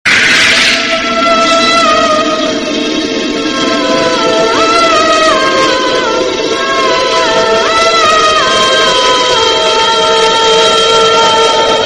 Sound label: dangerous ghost horror background music